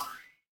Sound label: cartoon boing